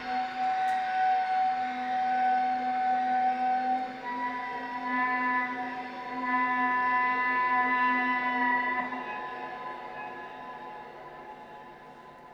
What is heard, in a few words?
Clarinet